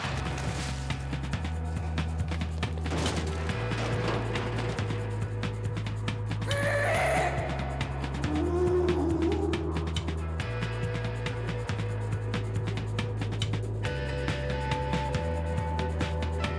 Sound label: ActionSus C no main guitar fade to drums and bass; mcsm music; Netflix